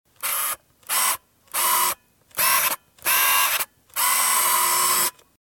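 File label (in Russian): приближение и отъезд автоматической фото камеры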